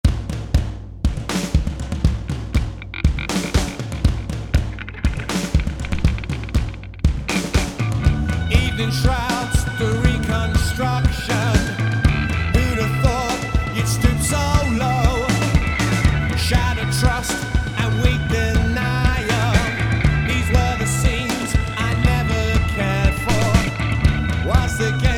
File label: British Rock